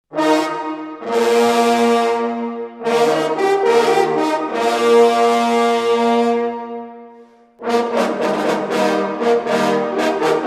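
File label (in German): BEGRUESSUNG Jagdhornbläser; Matsch; Weisskugel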